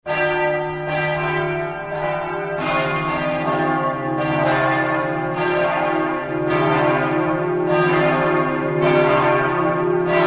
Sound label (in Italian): campane; ividale